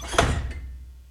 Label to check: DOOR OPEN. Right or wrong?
Right